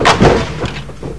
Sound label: Cake Detonate; Barrack Unity